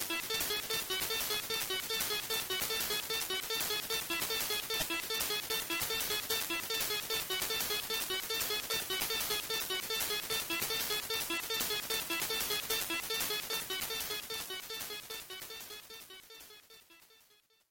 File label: Character Select; Combat Cars; Genesis